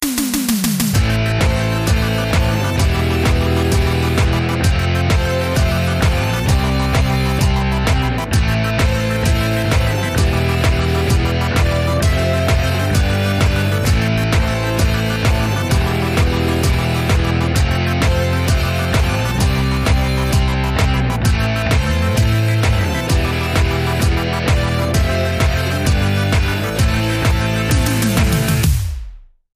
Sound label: future success